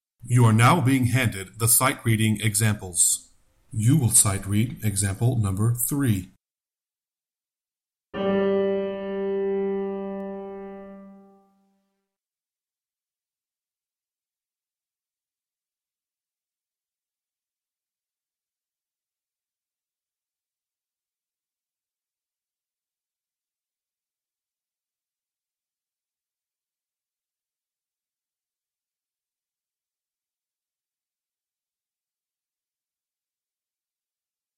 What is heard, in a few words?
Sightreading Example Bass